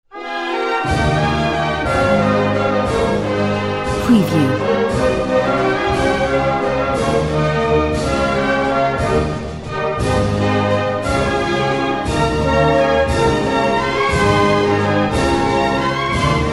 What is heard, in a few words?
Bangladesh National Anthem